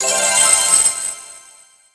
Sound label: coins collect